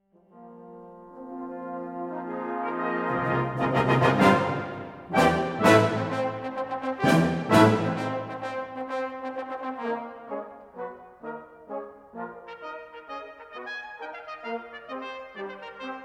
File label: Concerto For French Horn Brass Band Allegro Brioso